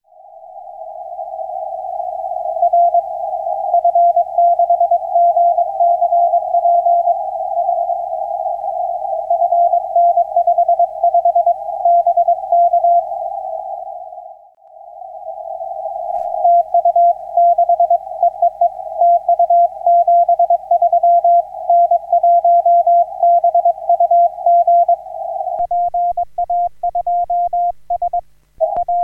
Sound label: 6m CW; N1BUG